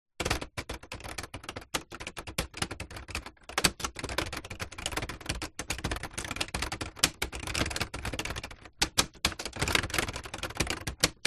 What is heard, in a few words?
electronic typewriter power off typing fast manic keyboard buttons; office; brother ax 450